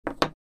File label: Small wooden blocks set down